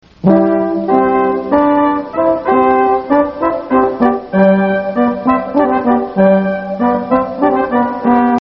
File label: hornpipe